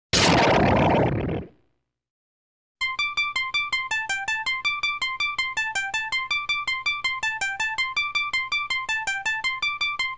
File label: COIN GAME START; Ninjakun Ashura no Sho